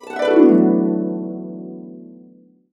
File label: D, Harps; USB Soundscan vol.62; Complete Harps; AKAI